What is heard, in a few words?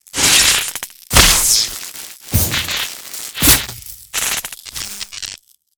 security cam sparks; Explosions; modern warfare 2